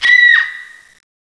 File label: bomb nuke after; CSO LightZombie